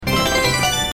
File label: item get; Paper Mario 2 The Thousand Year Door